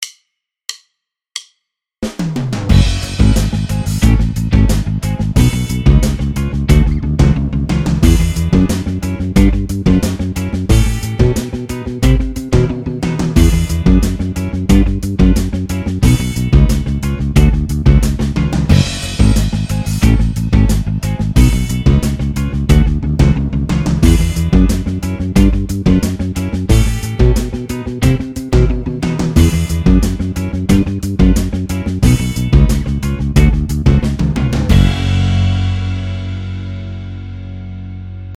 accents exercice, basse; exercices

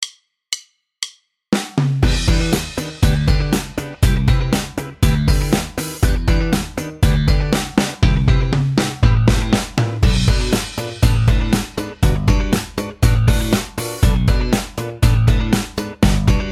slap exercice, basse; exercices

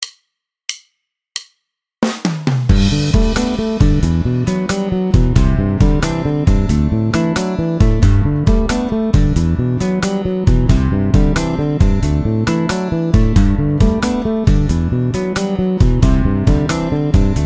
tapping

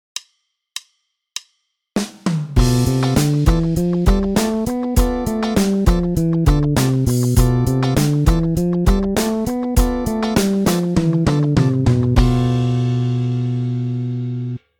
mode locrien, basse; exercices

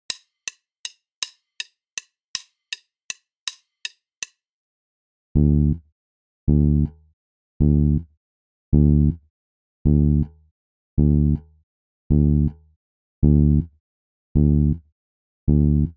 decalage triolets exercice basse tempo 080; exercices

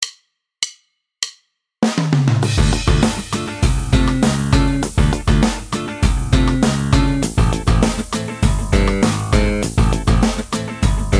notes muettes exercice, basse; exercices

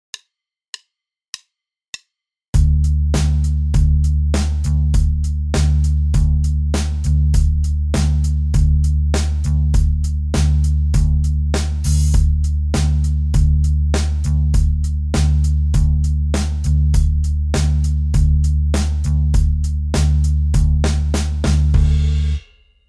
contretemps et syncopes binaires tempo 100

